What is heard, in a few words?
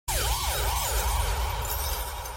siren